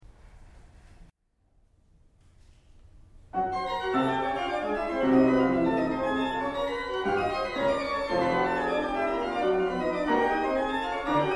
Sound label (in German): Sonate